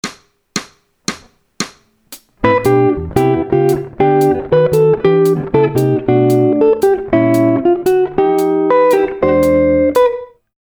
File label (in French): Accords Gospel advanced, Guitare; Les plans de gratte